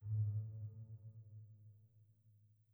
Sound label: chime old; chime sharp